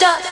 Vocal Oneshots; Vengeance Samples; Vocals

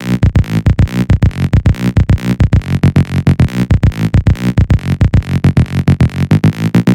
138 BPM Bassline, B minor

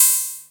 Ride, Cymbals; Vengeance Samples

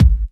Soft Kick; Vengeance Samples

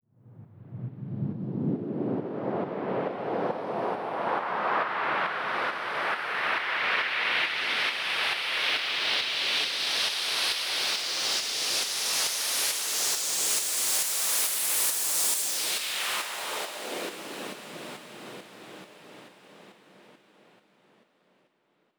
Universe Kit 137BPM Sweep Up Long, D minor; Sound FX; Vengeance Samples

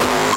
BD Distortion, Bassdrums; Vengeance Samples

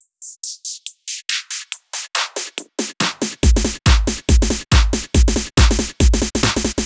Fill 140 BPM

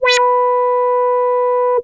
Arp D B4, ARP Odyssey, synth samples; musicradar-synth-samples

